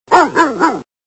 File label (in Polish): Szczekanie psa